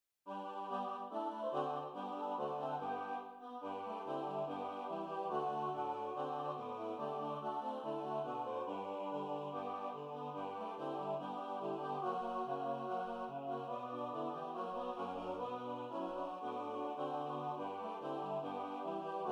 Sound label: Pizzicato Polka; (arr.) – Leo Delibes. SATB. (Lyrics: dabadoo); Lyden er datagenerert og ikke god